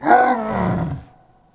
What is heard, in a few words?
attack, zombie; npc zombie; cstrike; jailbreak